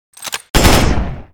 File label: Machine Gun